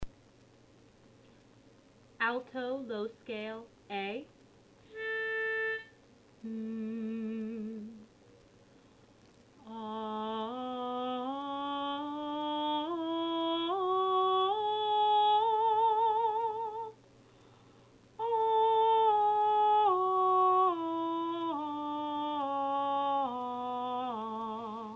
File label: alto low scale